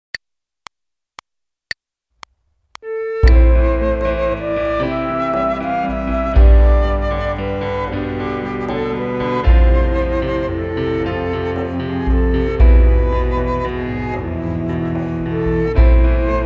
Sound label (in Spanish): Primera voz Flauta